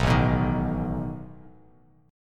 A Suspended 2nd Flat 5th A down Bass Standard

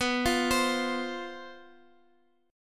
C Major 7th Suspended 4th, guitar

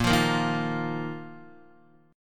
Bb Suspended 4th Bb down Guitar; Bbsus4 strummed

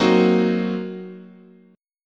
G flat 6th Flat 5th, guitar chord

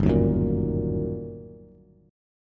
G Minor Major 7th Sharp 5th, G, down, Bass; GmM7#5 strummed; JGuitar

